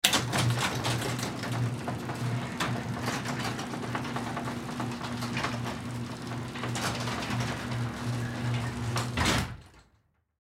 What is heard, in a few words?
Garage Door Auto, Garage Door Automatic Close; Garage Doors; Household; Blastwave